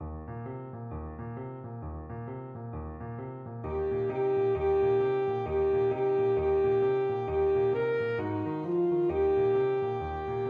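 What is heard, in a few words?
boogie, alto saxophone; jingle bells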